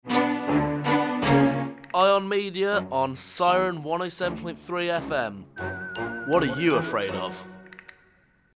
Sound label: Jingle